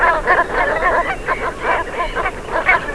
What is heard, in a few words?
Branta bernicla